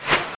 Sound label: Arm fly